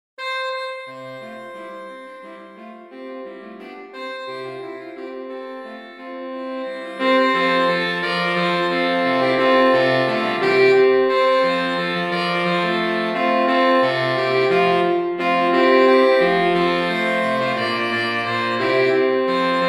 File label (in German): saxophonquintett